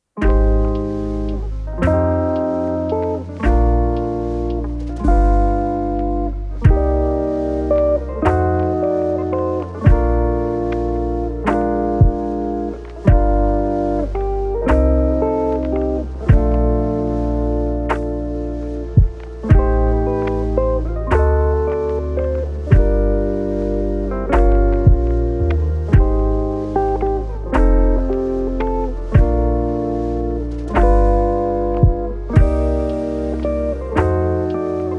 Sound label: lofi version